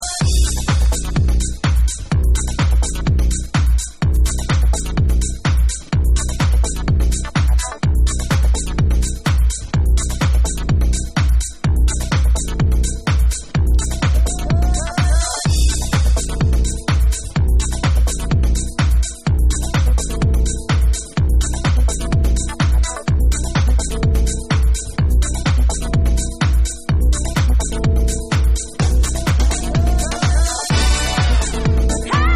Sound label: RUMORS Jazzy Dub